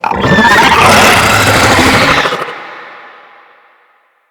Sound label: Infested Ancient Toxic Call; Enemies